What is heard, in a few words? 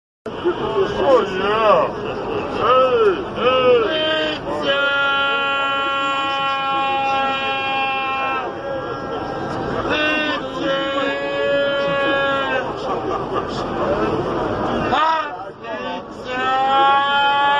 pizz; reversevape